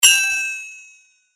Sword Sound; FXHome; Fighting Sounds

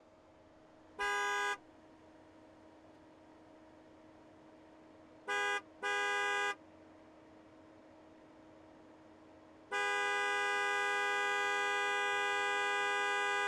Corvette C7 Z06 Horn Various; 2016; Pole Position

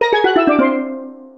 jingles STEEL; Kenney's Sound Pack; Music Jingles; Steeldrum

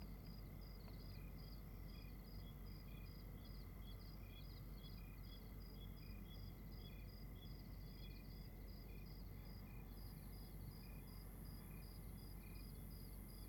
AMB External Rwanda Night Crickets; Monte Sound; Atmospheres of Rwanda

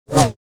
Saber; FXHome

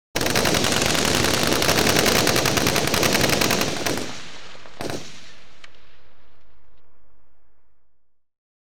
Warfare, 5 6mm Gas Operated Rifle; BBC Sound Effects Library; Explosions Guns Alarms

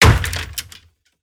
Misc Hits; SoundBits; Just Impacts - Extension II

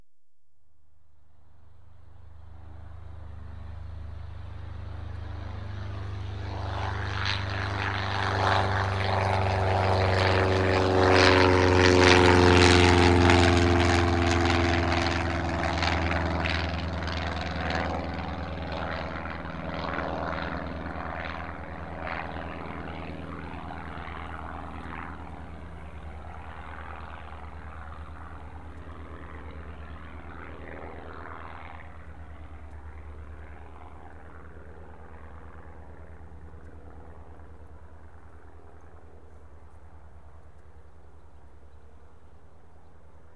Cesna Light Aircraft, Exterior; BBC Sound Effects Library

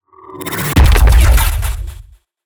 Impact; SoundBits; Whooshes & Impacts

